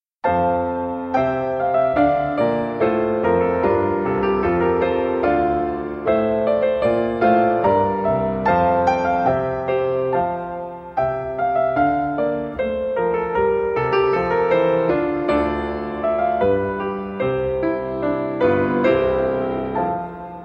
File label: Hornpipe from Water Music